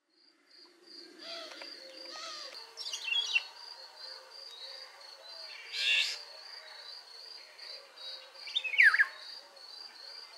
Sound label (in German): PIROL Fuetterung; vogelportraet; oriolus oriolus